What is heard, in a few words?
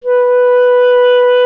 clarinet B, Solo Instruments; Miroslav Vitous - Solo Instruments VOL-1; 90 s Sample CDs